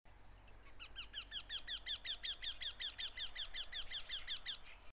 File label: arisui jinaki; アリスイ; Eurasian Wryneck Jynx torquilla; キツツキ目キツツキ科 冬鳥 17.5ｃｍ; 鳴き声：キィキィキィ・・・と甲高い声; 八王子・日野カワセミ会; キツツキの仲間であるが、普通のキツツキのように木に縦にとまることはほとんどない。; 当地では秋〜冬にかけての観察記録が多く、ほぼ毎年のように観察されているが数は少ない。